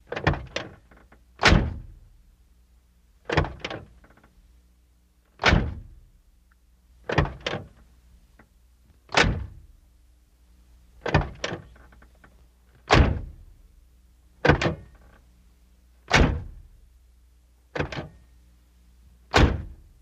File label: Car Doors Multiple